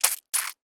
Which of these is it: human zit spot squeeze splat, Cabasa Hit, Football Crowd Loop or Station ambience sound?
human zit spot squeeze splat